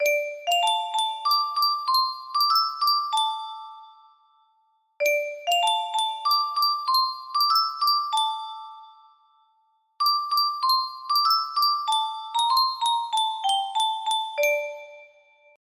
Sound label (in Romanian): Yunsheng cutie muzicala